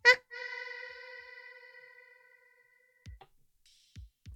ah echo

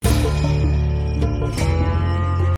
intro short; breaking bad

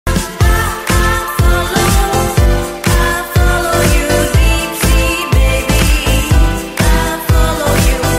follower sound

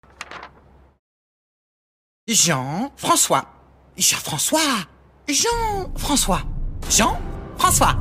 noises

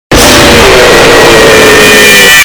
jumpscare loud; fnaf 4

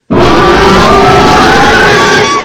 screamer

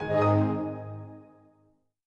windows xp logon alternate

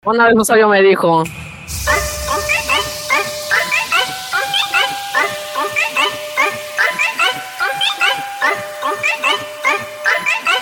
drop

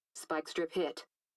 spike strips hit; nfs rivals beta

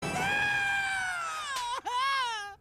screaming

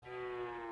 moo; a minecraft movie

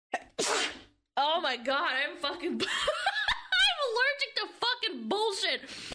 sneeze

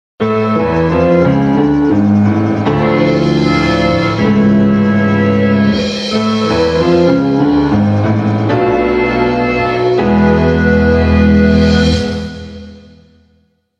organ intro; count von count

